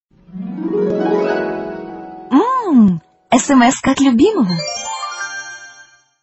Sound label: sms; ka ot ljubimogo